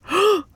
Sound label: Emote Female Shocked; Idle Champions of the Forgotten Realms